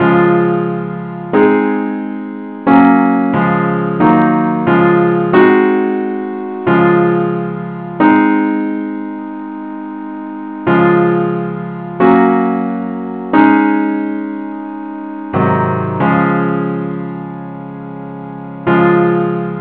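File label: simple Acc key d flat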